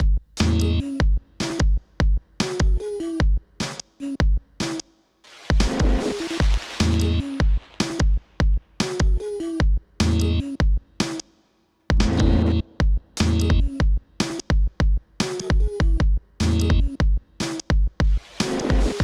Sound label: drop drums no hats